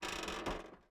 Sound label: Mech Footstep Texture Metal; starbites